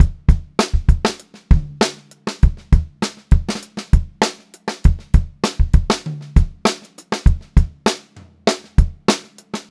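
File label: STRAIGHT VARIATION, Funk-Groove Drumloops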